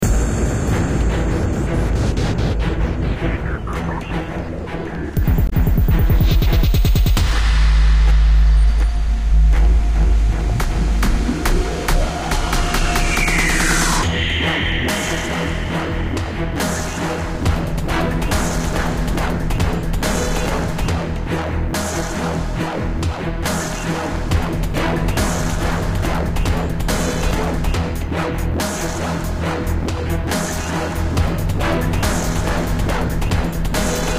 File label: wub machine dubstep; sven; fallback ice; sc5 theme